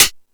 High Hat, Drum Machines

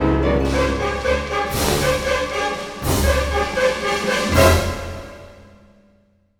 Orchestral Loops

